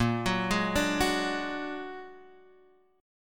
Bb Augmented 7th Bb down Guitar Half Step Down

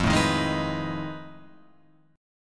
F 7th Sharp 9th Flat 5th, Guitar, Open F; F7#9b5 strummed

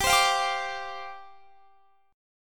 G 7th Suspended 4th G down Guitar Standard; G7sus4 strummed

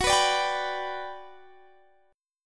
G Major F sharp

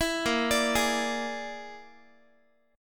A sharp 7th Flat 5th A sharp down Guitar; A#7b5; arpeggiated; 19,18,17,18,x,x

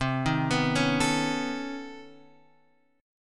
B 9th C down Guitar Standard; B9/C arpeggiated; JGuitar

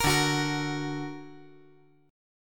D Augmented, chord

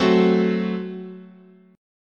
Gb Diminished, chord, guitar

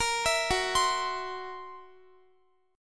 Fsharp 7th Flat 5th down Guitar; F#7b5 arpeggiated

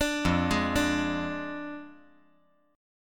F Minor 6th F down

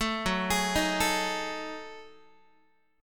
F sharp Minor 6th Add 9th, Guitar; arpeggiated; 12,14,11,13,13,x; 4A,4E,5A,5D,5G#,6C#

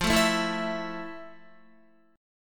F Major 7th Guitar; strummed; chord; FM7; 12,12,9,9,9,12; JGuitar

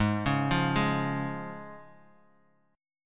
F Minor Sharp 5th, Ab

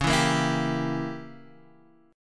Db Diminished 7th Db down Guitar Half Step Down

